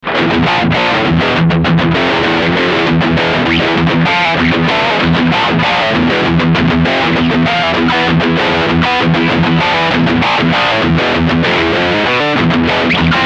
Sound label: Riff TSS Full EQ